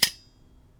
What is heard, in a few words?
Spring Loaded Blade; bb - Smol Mechanisms